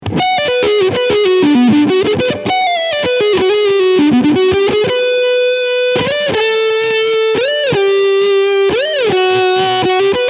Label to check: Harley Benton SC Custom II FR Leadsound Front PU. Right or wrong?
Right